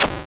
Roll; Gloom

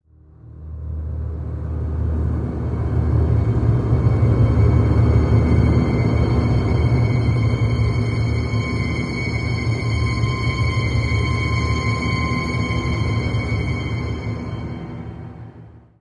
Tension; Darksiders

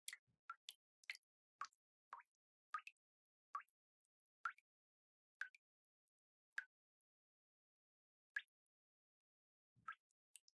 asylum bathroom sink dripping; Devour

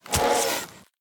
Ghost Attack; Devour; The Town; devour 2021 gamerip pc macos

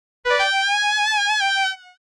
Jungbeat

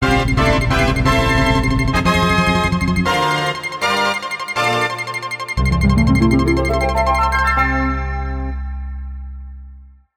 Game Clear; bomberman remake; bomberman 94 remake

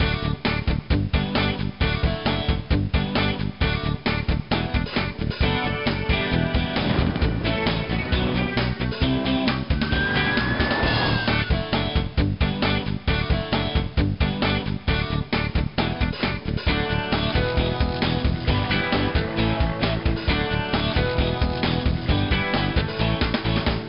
Music Loop; fireman sam always on duty 2010 nds

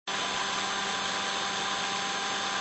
blender boosting; gamerip; Papa's Freezeria

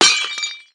Pot Break Large; angry birds rio

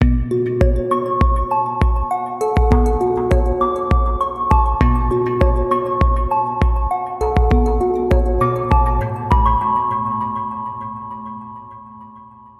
boss interlude 100bpm; 16beats; chicory a colorful tale; ps4

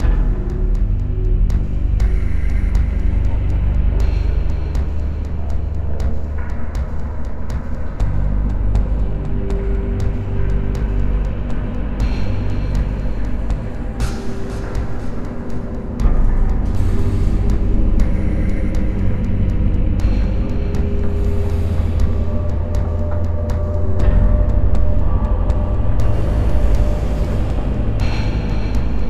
Mines Ambience; evil dead regeneration 2005

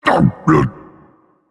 Vocal Colossus Pain Reactions

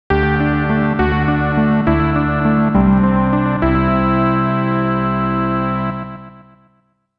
ITEM GET (Item Acquired); Lagoon

